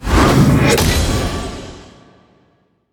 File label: heroes celestial Judgement impact; lumenir